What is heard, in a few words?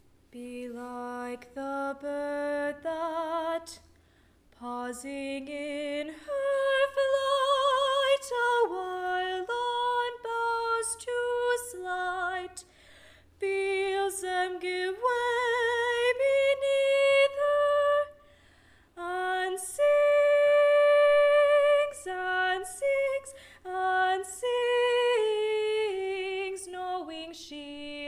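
Be Like a Bird Female Low, Chorus; Female Low Voice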